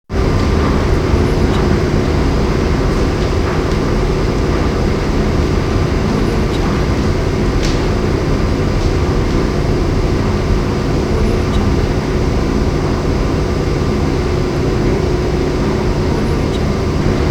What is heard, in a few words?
machine metal rumble